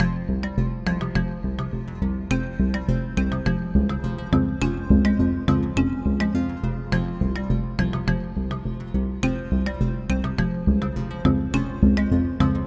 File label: Balinese Dawn